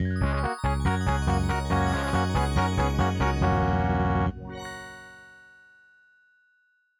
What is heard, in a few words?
Fanfare, Roland; Apogee; Blake Stone Aliens of Gold; MS-DOS